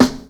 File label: snare; PlatinumPhattCD1; E-MU Producer Series Vol. 8 – Platinum Phatt